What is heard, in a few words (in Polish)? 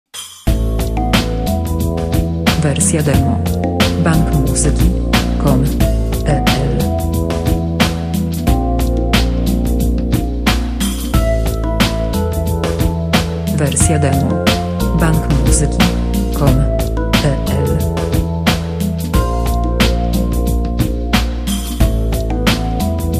melodyjka